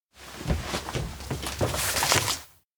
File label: note search; anemoi